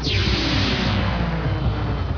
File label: chair lost money